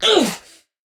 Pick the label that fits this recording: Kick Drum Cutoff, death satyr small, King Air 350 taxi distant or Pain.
Pain